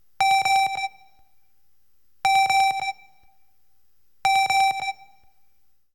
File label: High Tone Ping